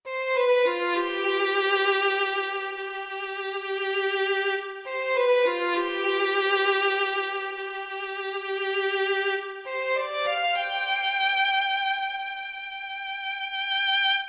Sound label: Violin Only